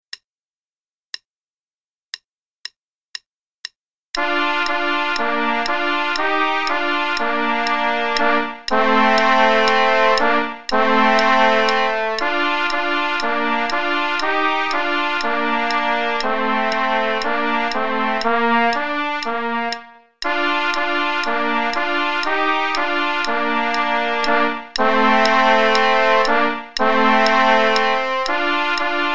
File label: Play-a-long, both parts, qn=120; Camptown Races; trumpet